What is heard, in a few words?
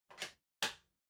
Portable CD Far Battery Cover Close